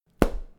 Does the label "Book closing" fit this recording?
Yes